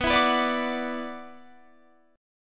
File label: F sharp 7th Suspended 4th Sharp 5th B